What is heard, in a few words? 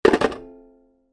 ukulele down on hard surface